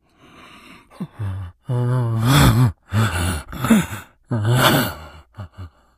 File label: moan, infected, idle moaning